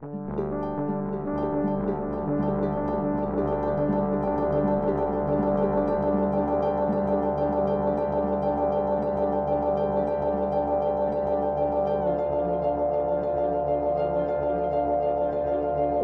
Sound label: timeless feedback